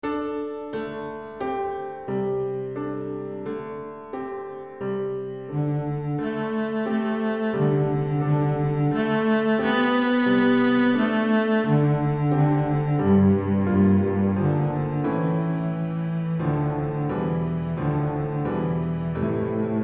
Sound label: Cello Piano